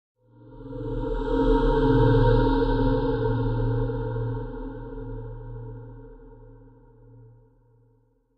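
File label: Stab Ascending Electronic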